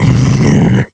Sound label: Acquire; Jack Frost